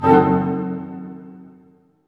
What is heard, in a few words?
HIT, Tutti Hits; String Master Series; Dynamic Orch